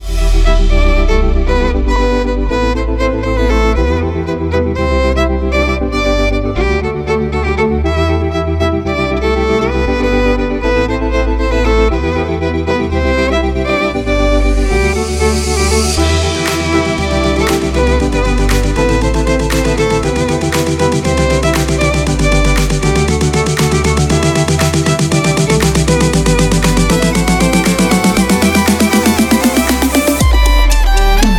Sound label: violin remix; ringtone